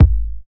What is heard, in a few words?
kick drum single hit G sharp key